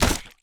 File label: Damage By Cannon Ball